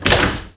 kchunk, doors; Heretic II Demo

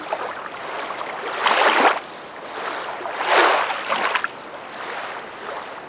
Water Lapping; RallyXtreme; Environment

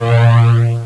new level; Operation Intercept 1.0.1

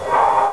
Cheer

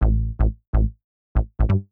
SNTH BASS PROGR 125 A; Dance eJay 3 + Sound Collection 3

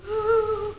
moan; graveyard

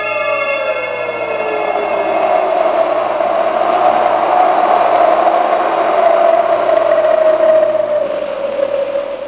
storm brewing; Sound Effects for Multimedia - Volume 1

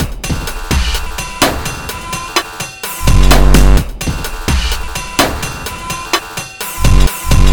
Sound Kit; Wicked Kit Demo; NI Carbon; battery

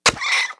fowl die; Immortal

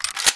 pickup, weapons foley; Spearhead Demo

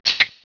slider

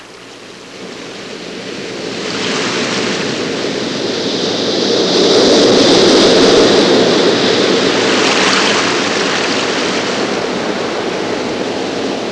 ocean waves light; Sound Effects for Multimedia - Volume 1

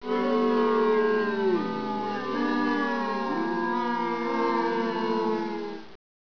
crowd boo

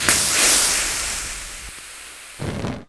stickybomb fuse out; Spearhead Demo; MacFormat 2003 June

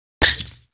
grenade pull, weapons foley; Spearhead Demo; MacFormat 2003 June

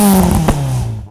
pop; Car

